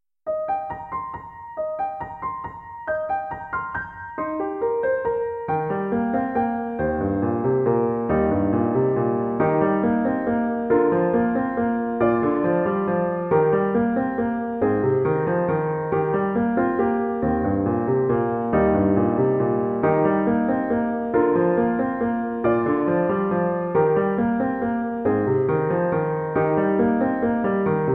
piano only

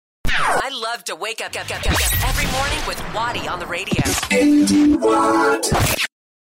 jingle; wake up with wattie

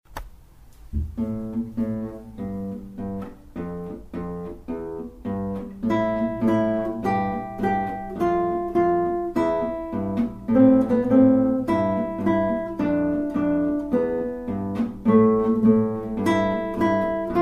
bass riff

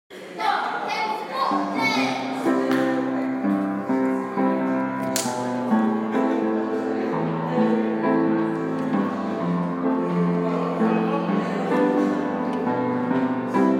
school choir

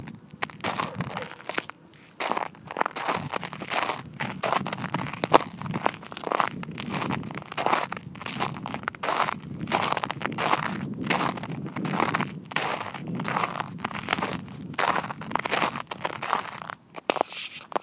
walking at frosty warley woods

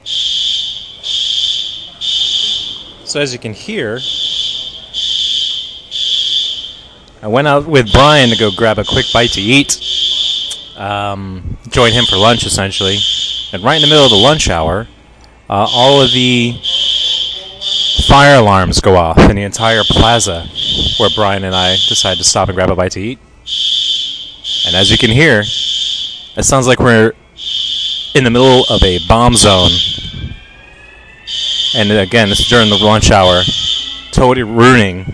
ouch loud noise

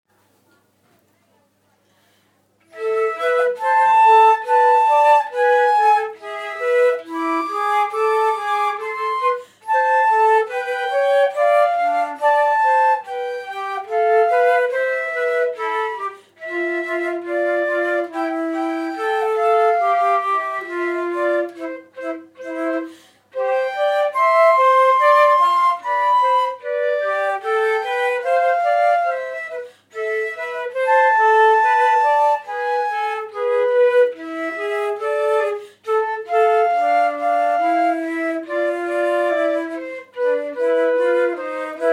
duet no 2; devienne

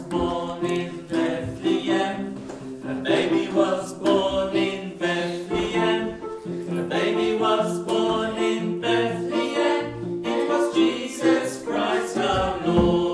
class singing

